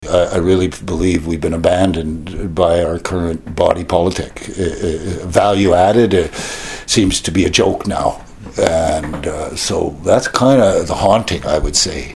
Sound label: Tom